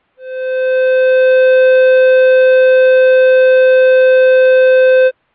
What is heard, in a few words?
Gradual Horn Steady Horn, Gradually Increasing in Volume 514Hz